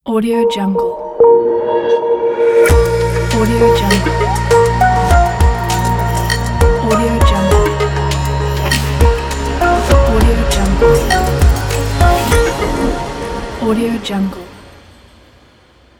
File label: digital atmospheric logo